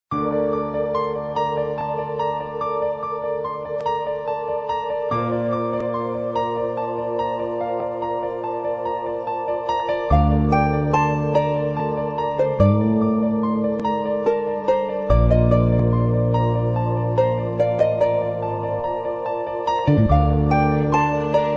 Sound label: Suspense